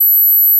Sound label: Gauss Filt B7